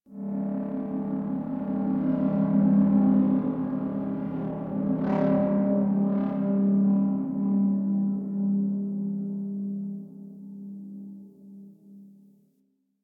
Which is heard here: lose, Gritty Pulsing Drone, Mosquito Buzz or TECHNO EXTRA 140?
Gritty Pulsing Drone